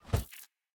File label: staff spin; smite; ahpuch skin10